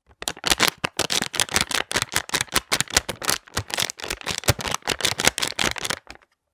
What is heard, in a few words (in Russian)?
hrust deneg